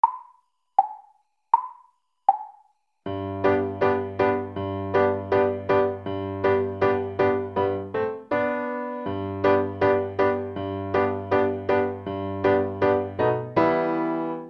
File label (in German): Tuerkischer Marsch - Marche turque schnell